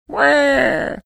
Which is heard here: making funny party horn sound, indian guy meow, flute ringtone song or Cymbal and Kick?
making funny party horn sound